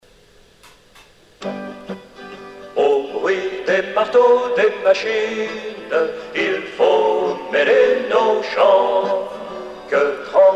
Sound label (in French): chant de lusine